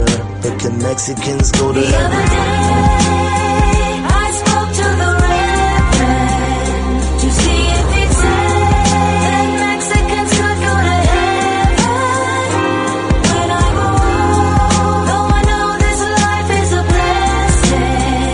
mexican ringtone

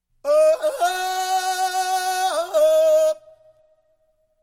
funny tarzan ringtone

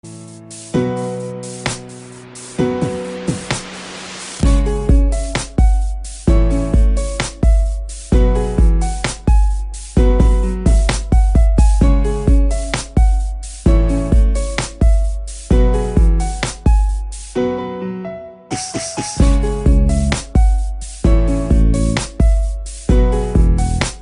sad heart touching piano ringtone

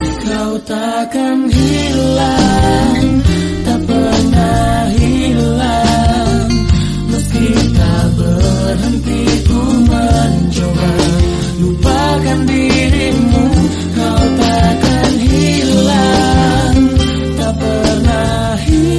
indonesian ringtone

